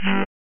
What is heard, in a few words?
Teleporting; Dungeon Master and Chaos Strikes Back; Amiga